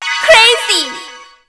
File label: COMBO